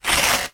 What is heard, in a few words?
card rip; foley